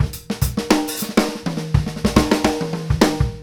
FILL

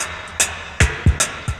HAT, HI HAT LOOPS, Dub; Drumdrops In Dub VOL-1

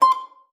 STOP B5, harp; Xsample VOL-8; HARP+FX

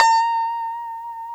FUNK POP, STRAT; Guitars; Spectrasonics; Hans Zimmer Guitars VOL-1

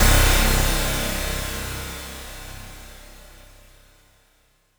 C1, SynthLine; 90 s Sample CDs; NiceBeats; SynthLine VOL-20

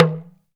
TALK DRM, Heart of Africa; Spectrasonics

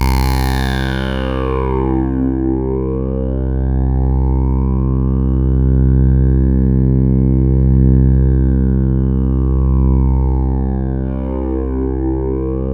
C2, Roland JV 2080 TECHNO PAD

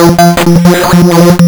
lsdj waves; wavetables

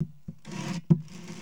LITE BOX, Box Noises; E-MU Producer Series Vol. 3; Hollywood Sound Effects

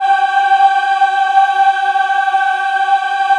G4 MW AH, Choir; USB Soundscan vol.28; Acoustic & Synth; AKAI

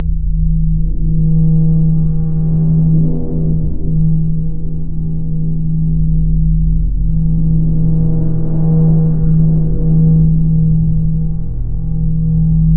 Dist LFO Tones; neuro; BIG5AUDIO

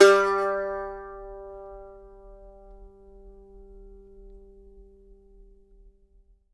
MANDO NOTES, G3; Sampleheads; Whole Lotta Country 1 & 2

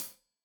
Hat Tight, Hi-Hat; Deeply Sampled Drums

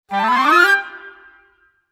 Woodwind run G7 (for C min); PowerFX; Downtown Orchestra; Runs

